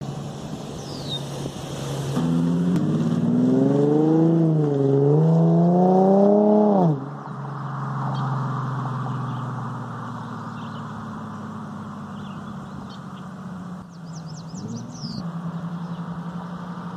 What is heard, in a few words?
muffler delete resonated; moonlight white g37xs